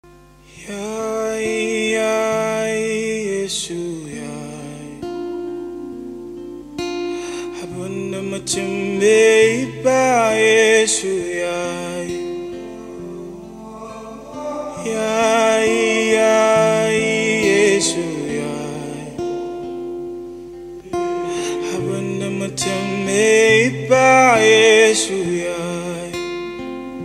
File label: Acoustic Cover